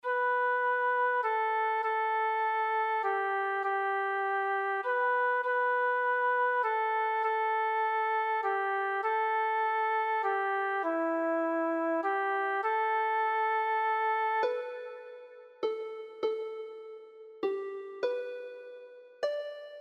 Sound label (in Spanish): Sonido de lira